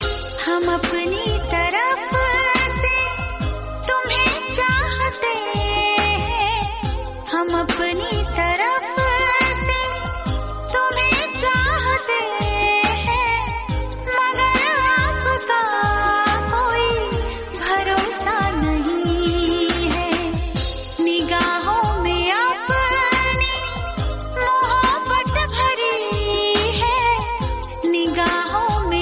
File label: instrumental song